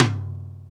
TOM, Cheez; Club-50 - Foundations Roland

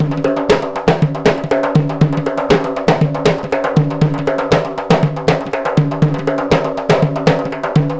PERLP, ArabianTraditions

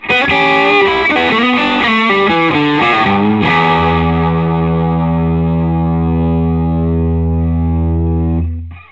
SOLO E, Vintage Blues Guitar

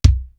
room kick; East West; Global Sounds

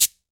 CABASA, Latin Hand Perc

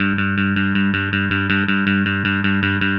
Happy Pub Bass

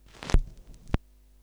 Stylus Down, Vinyl Noise

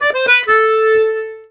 TARANT, Complete Accordions; USB Soundscan vol.40; AKAI

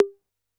D4 Analog Conga H, Yamaha PSR-E323

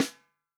REAL DRUMS FUNK KIT

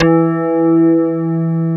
WOOD BAS; Synclavier Sampler Library; KEYS AND GUITARS

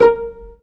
STR PIZZ F3, Strings; STR SECT #1

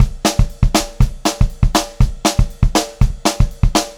120 SPEED, Pop-Rock Drumloops; USB Soundscan vol.37; AKAI; 90 s Sample CDs

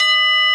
Failure Chimes loop, SNES; Cu-On-Pa

